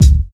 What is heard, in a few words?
old school focused hip hop bass drum sound c sharp key